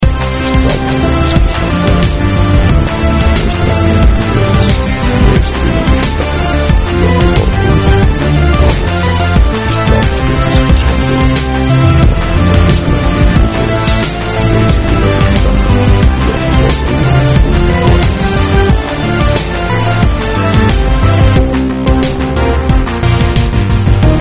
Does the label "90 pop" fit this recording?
Yes